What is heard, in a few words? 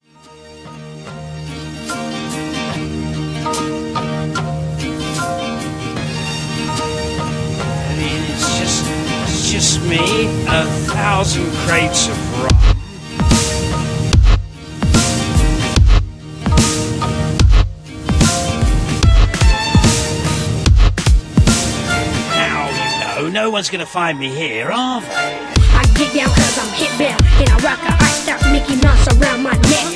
piratestep